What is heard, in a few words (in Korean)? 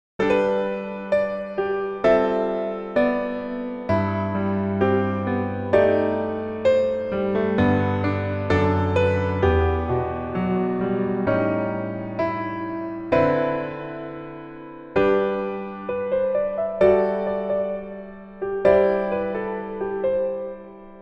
Piano ver, 멜로디MR